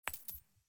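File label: environment building sold coin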